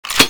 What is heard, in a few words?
gatling clip in